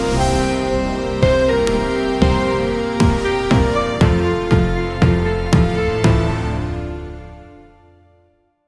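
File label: an upbeat and energetic, commercial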